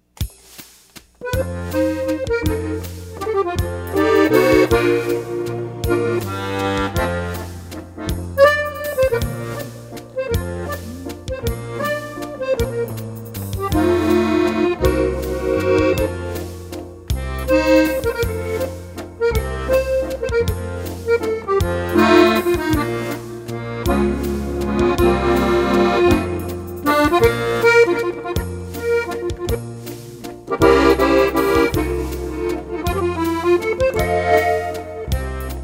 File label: Italian Band; Denver